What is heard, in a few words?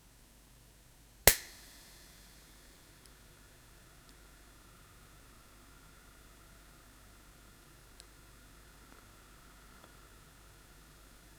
the crackle of a flickeri, fire